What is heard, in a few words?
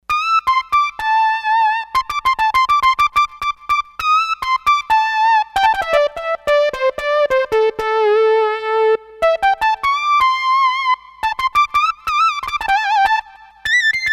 Neat Lead; Factory Patches